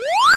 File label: Body Slam; pokemon reborn e19 2022